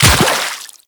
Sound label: Run Water, Footsteps; Warframe; The New War; Characters; Kahl